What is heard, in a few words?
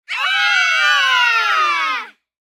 Kids Scream Afraid, Human, Kids, Scream, Afraid; Talking; Voice; Blast Wave